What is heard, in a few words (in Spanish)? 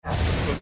pum; chiringuito